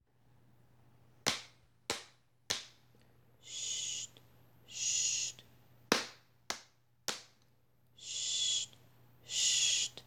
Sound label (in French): rythme cuisses